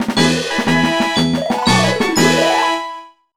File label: Jingle Game End Good; Disney Fairies Tinker Bell and the Lost Treasure; Nintendo DS